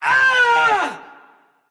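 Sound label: non verbal ouch, voice; cernunnos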